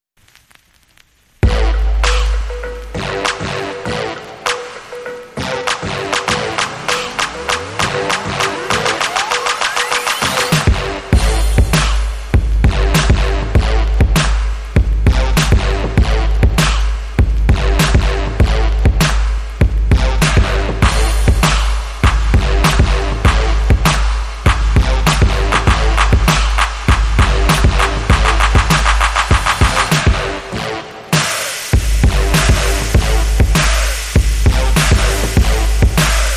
Boom Bap, Underscore

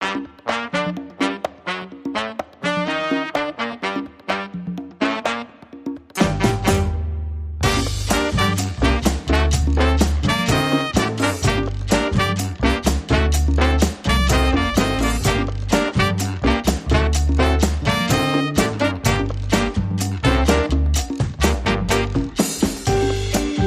Cha Cha

